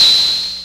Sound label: Clapbox Noise; Ableton Foundation FreePacks